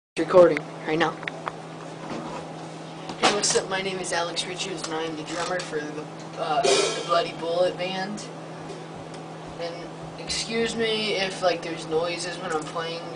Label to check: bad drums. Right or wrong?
Right